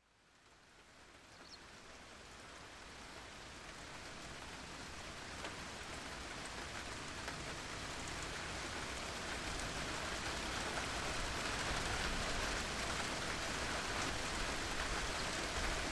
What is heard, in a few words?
Parking Lot Rain